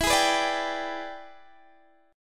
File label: E 7th Sharp 9th Guitar Open D, chord